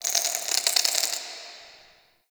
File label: Under City Insect Wet Scurry